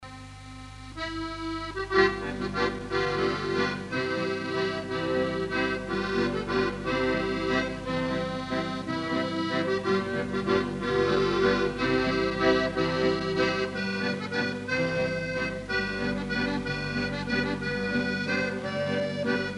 Triolett; Benneckensteiner

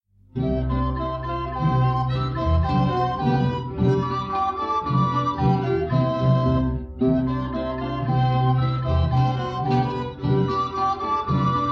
Historischer Tanz

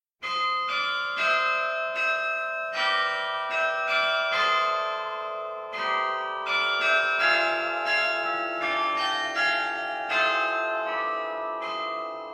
Glockenspiel; Da steht eine Burg überm Tale

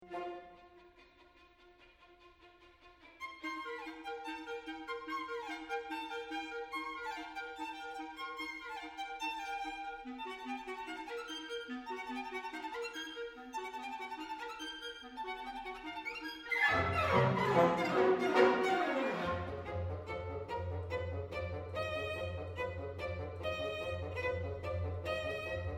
Galopp; Allegra Vivace

